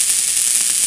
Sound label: spark, weapons pulse